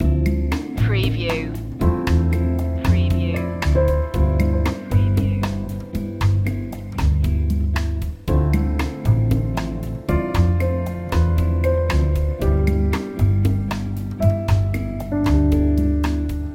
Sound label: moody bossa loop